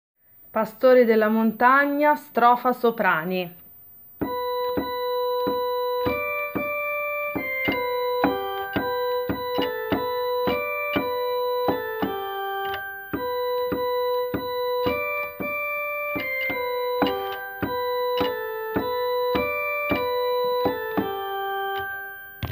strofa soprani; Angeli nelle campagne

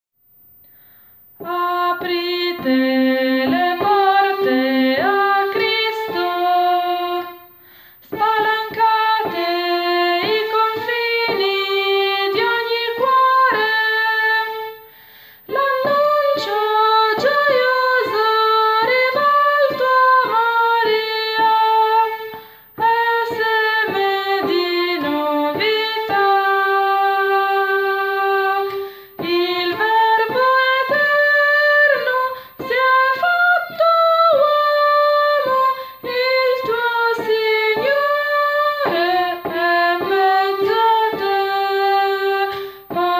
soprani; Aprite le porte a Cristo

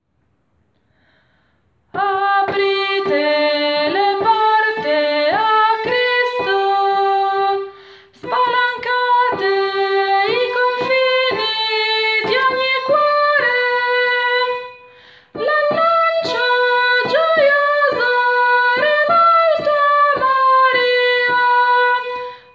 contralti; Aprite le porte a Cristo

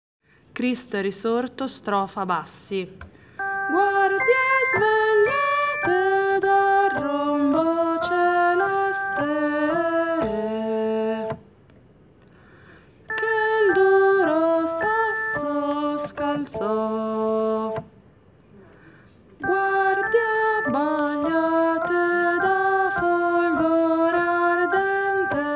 strofa bassi; Cristo è risorto

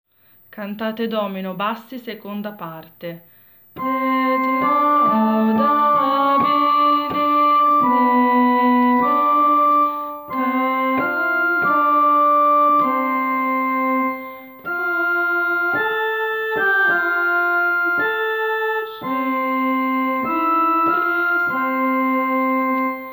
bassi; Cantate Domino

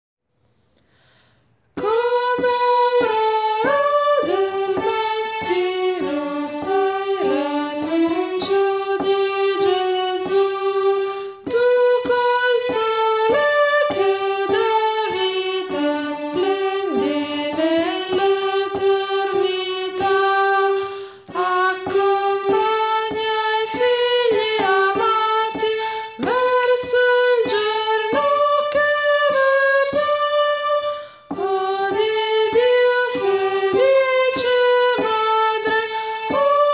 Come aurora del mattino soprani

